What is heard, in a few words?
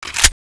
clip in; tfa cso; horse gun